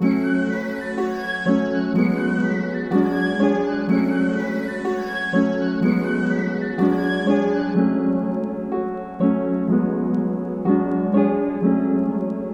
124 BPM F# Min, Melody; Cymatics; 2022 Melody Collection Platinum Expansion

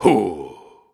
Grunts and Chants, Viking Vocal

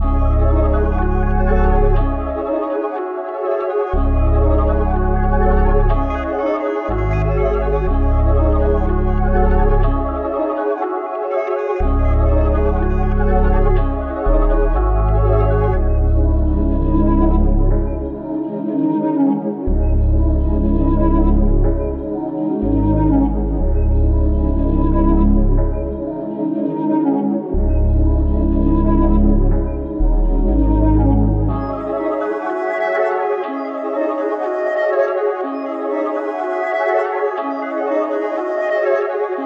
122 BPM C# Min, Melodies; Cymatics; 2022 Melody Mystery Expansion

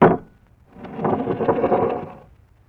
Glass On Bar With Slide; Vintage Cartoon; Foley

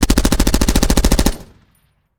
Freeze, Guns

